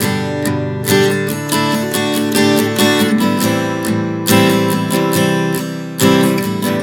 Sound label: Progression 070 G-D-Am-C, Acoustic Guitar, Chord Progressions 070 bpm; Sonic Foundry; Songwriter Acoustic Guitar Companion